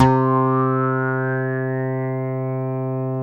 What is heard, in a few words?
soft reso clav, swing synths; Zero-G - Funky Elements